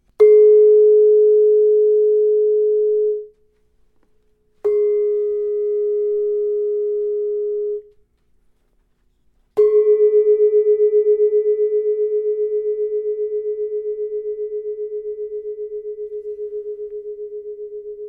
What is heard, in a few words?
balinese gamelan example